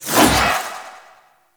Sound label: Doom Sword Whoosh C; Excalibur